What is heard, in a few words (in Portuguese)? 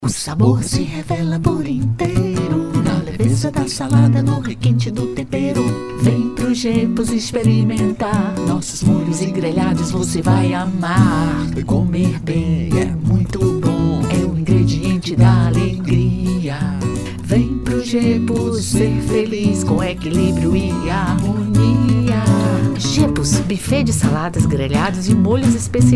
canto voz guia; GEPPOS; NOVA LETRA; ASSINATURA GEPPOS